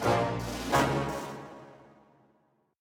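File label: Jingle Magnifying glass; My Little Pony Color By Magic; android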